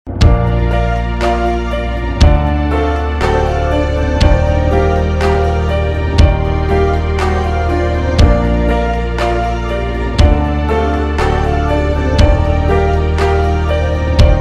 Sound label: instrumental ringtone